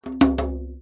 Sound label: drum; c-evo